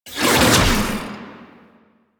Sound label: Whip Whoosh; Warframe; The New War; Archon; Nira